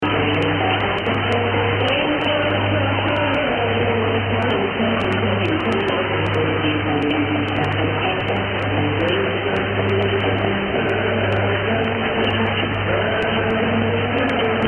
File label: Radio Libya